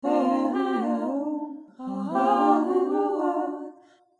115 Ballad 2 Harmony

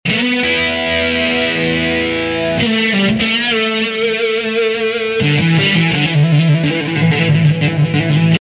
Em 080 120 solo

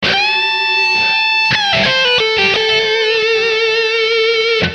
Am lead 115-125

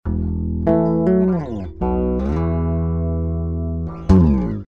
E 103 Ambient

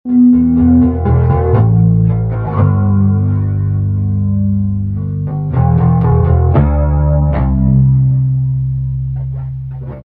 Bass Amb E 120

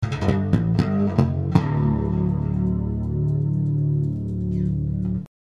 Bass Amb Slap D 118

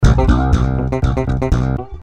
C 120 Steppin Funky

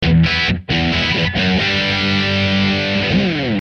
E 135 chords Metal

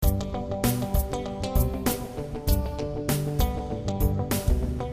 Country Funk 98 G

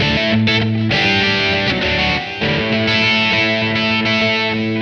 rhythm, 100

